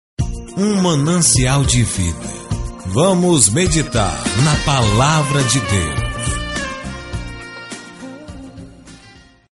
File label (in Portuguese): vinheta